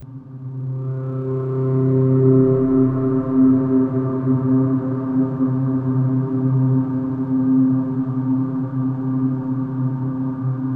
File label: Stinger Exploration Drone